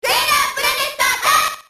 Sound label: attack; snes; smas; sailor planet